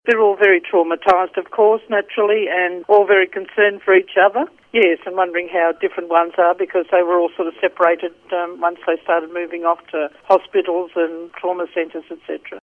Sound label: bowls accident